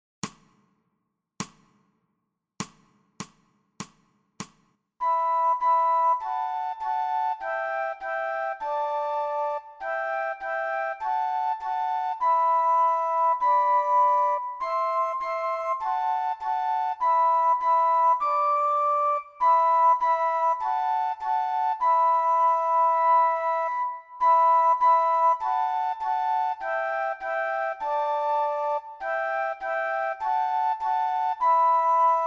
due flauti

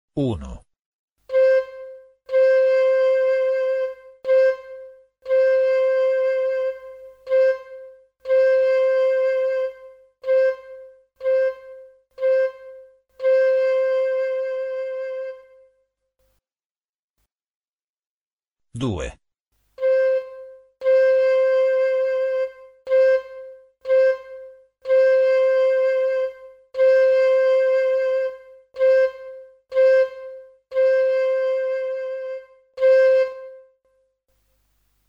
esercizio suoni corti lunghi

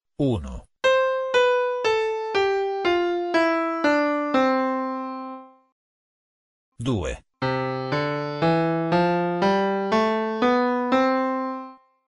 esercizio scale

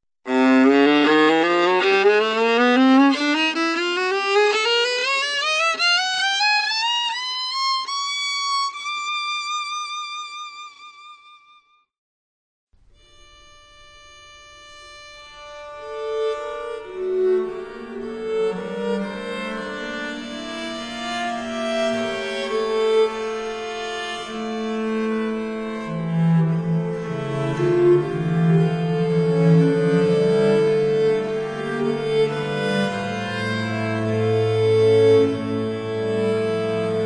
viola da braccio

